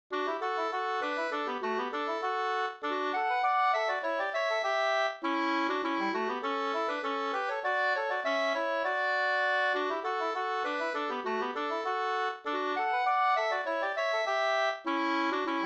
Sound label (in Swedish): polka, 2st